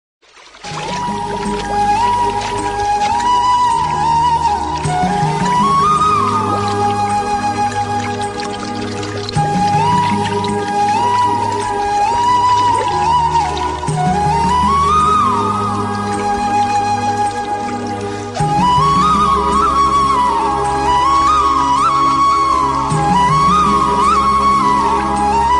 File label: bgm instrumental